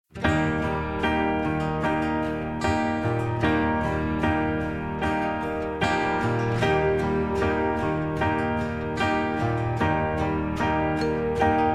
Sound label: (Eb) No Backing Vocals